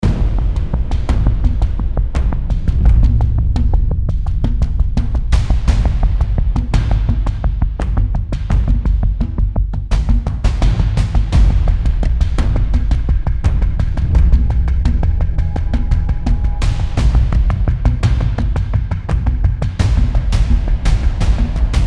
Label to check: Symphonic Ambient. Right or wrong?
Right